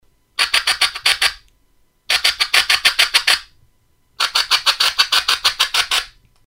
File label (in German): eichelhaeherlocker; kraehenlocker und jagdlocker; Faulhaber